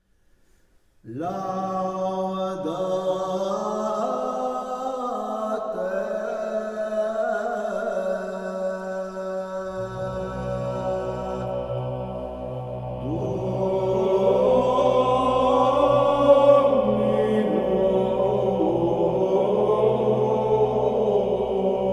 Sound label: Tractus; Laudate Dominum